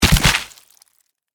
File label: Impact Body Fall, Horror, Impact, Body, Fall, Splat; Blood and Guts; Blast Wave